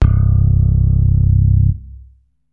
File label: Vib, Synths BASS